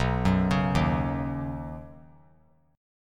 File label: B Major Add 9th B, chord; Badd9; arpeggiated; JGuitar